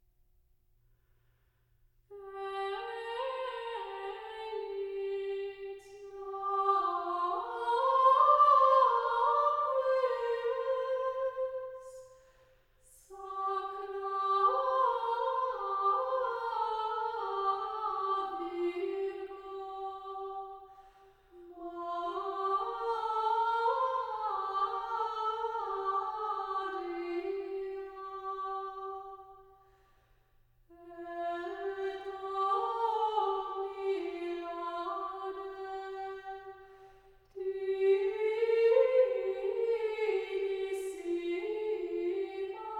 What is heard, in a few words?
Felix namque (chant); Offertory; An English Ladymass